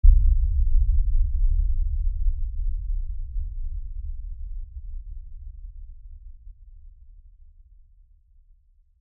Impact Lfe Rumble; Impact Lfe Rumble Long; Low Impacts (Sound Effects:Impacts); Blastwave

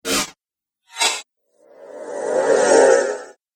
Whoosh Trio Pack; Andrew Fleming

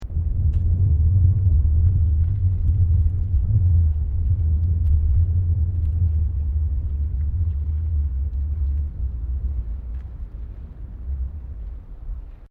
Wind Buffeting Unusual; Unusual wind sound; Wind (Sound Effects:Weather); SoundEffects-Foundation4

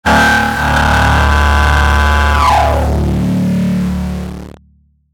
Sweeper Filter Distortion; Production Element Imaging Element Accent Transition; Sweeper Sound Effects

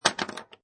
Pinball Sound Ball Launched; Video Games

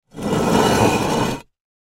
Rock Slate Movement, Rock Slate Movement Slide; Cinder Block; Materials; Foley; Blastwave

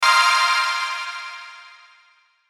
Hit Shimmer Burst Bright; Blastwave

